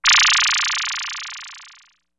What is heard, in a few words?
FRAZZ; E-MU Formula 4000 Series Vol. 2; Effectors